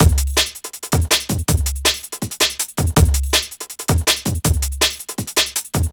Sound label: Craft, breaks; Jungle; Jungle Warfare 1&2